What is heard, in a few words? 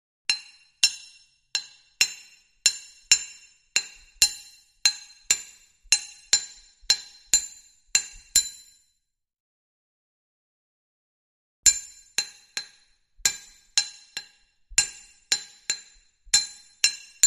Instruments Hammer Sm, hammers